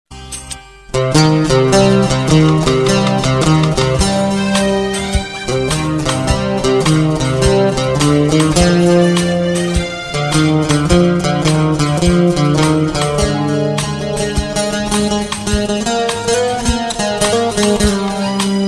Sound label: Old Instru